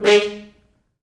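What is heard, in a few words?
French Horns stops, G#2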